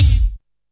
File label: 00s trebly kick single shot g key